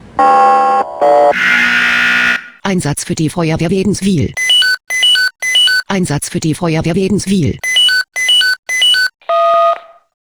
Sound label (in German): SMS Alarmierungstone; FW Waedenswil